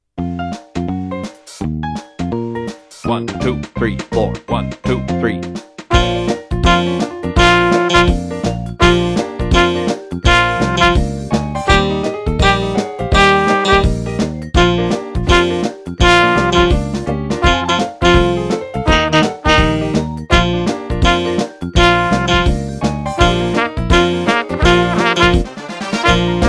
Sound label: Jazz A